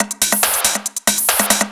hard beats 140, hard trance beats

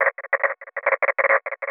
TECHNO EXTRA 140

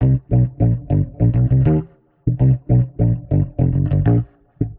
100 C, Warped Bass; Loops; Touch Loops; CosmicFunk

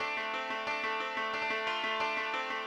ETHNO 90 D

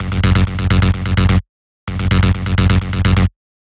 bass 128 F#; Electro; bass loops; Anthemic

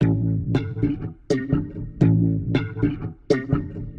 120 C Water Plucks, Warped Bass; Loops; Touch Loops; CosmicFunk

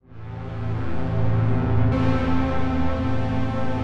DEEPHOUSE ORGAN 125 A

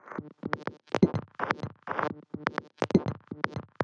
125 Complex, Percussion; Loops; Percussion (Stereo); Touch Loops; ElementsOne; AbstractRhythms

